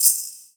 One Shot Shaker, Drum N Bass - Percussion; Sonic Foundry (Sony Creative Software); Knutrix Drum & Bass

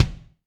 Kik, Drum Machines, Alesis D4

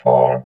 VOX VOX, Electro Vox

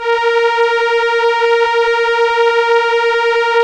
STR ELKA, Elka Strings, Elka Cellos; Roland LCDP09 Keys of the 60s and 70s 1

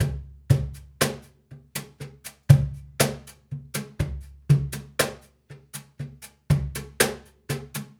Cajun, Percussion, Drums; Essential Percussion

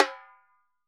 Repique Rimshot; Best Service; Festa Latina VOL-1; Single-Hits

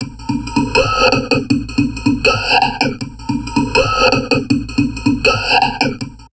TALK, Drum Loops Crazy Processed; 160 TALK A; USB Soundscan vol.07; AKAI

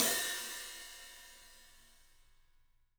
ZILDJIAN HT; 3D Sound; 3D Drums

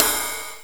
RIDE, Korg ddd5; Drums

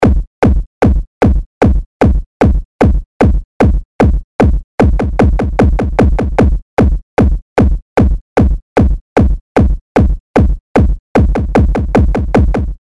Kicks; Industrial

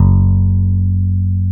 P BASS F#2, pick bass

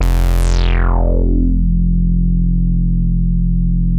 F2, Bass; E-MU Producer Series Vol. 6 – Analogia Project (CD 1); Boom da Bass 2

